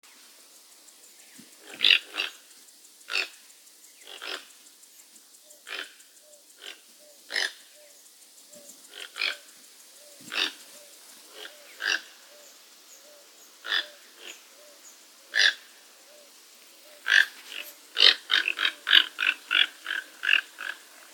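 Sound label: Ramphastos toco, bird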